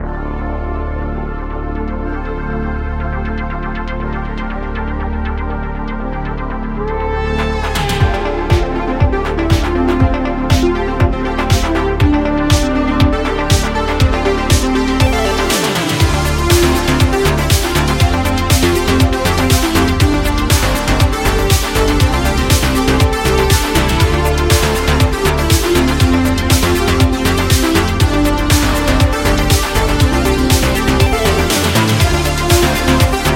Retrowave, synthwave

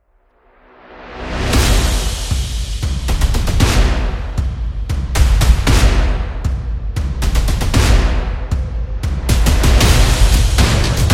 Epic Trap Drum Beat

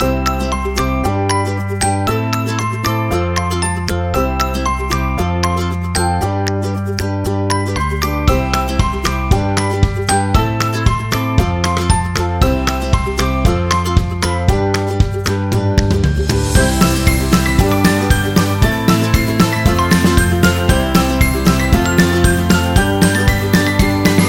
Fast Happy

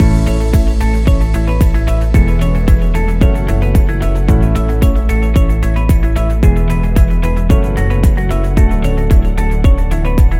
Positive Corporate Loop Background; hi tech

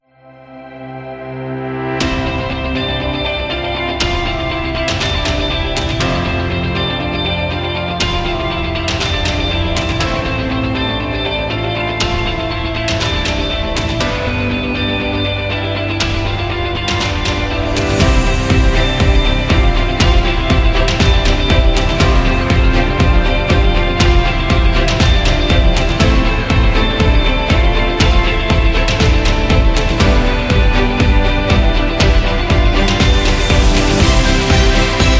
Motivating Dynamic Background; corporate beat

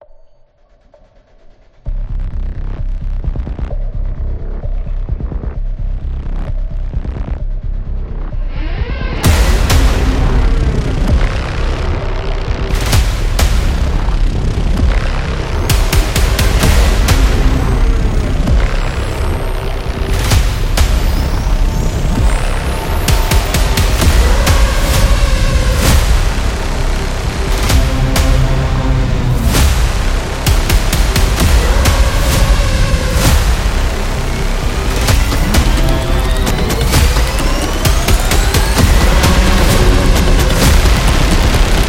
Spooky; dramatic

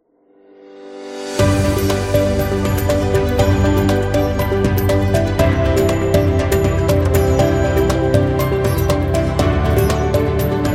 India Travel

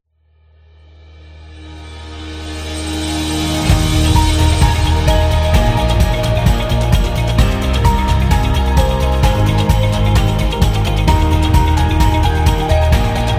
Dynamic

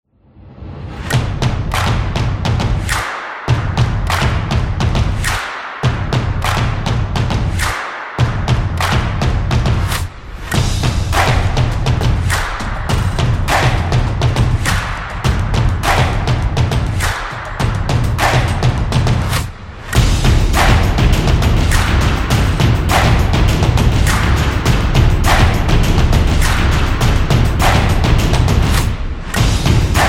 Percussion Promo Drums

